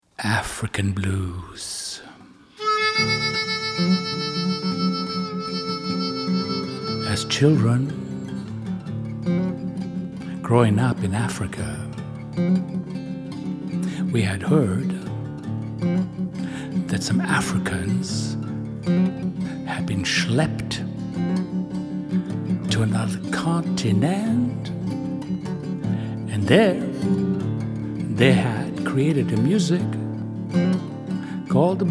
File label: African Blues